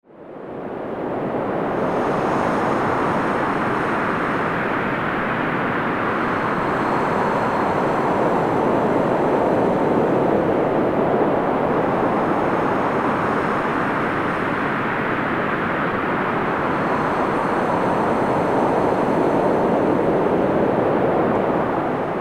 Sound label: breathe with me 5s in 5s out calm